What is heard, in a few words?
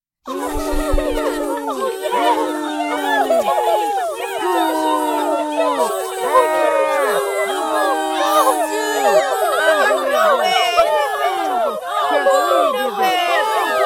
booing crowd